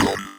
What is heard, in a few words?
screams android